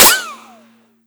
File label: ricochet; arccw